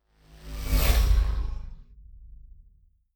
sci-fi vehicle drive by buzz; raumschiff

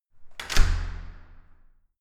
entrance door close hard

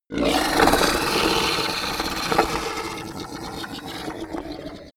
reptile choke long

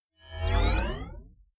screen turn on whoosh heavy; energie

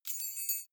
key ring large fly tinkle; objekte; schluessel

